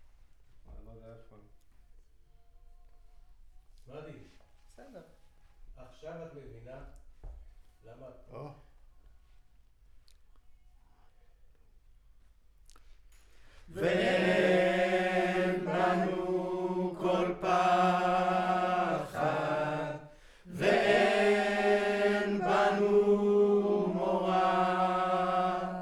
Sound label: Center u47, Choir